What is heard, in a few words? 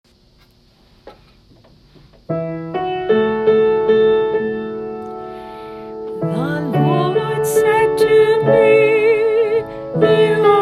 Antiphon; Christmas midnight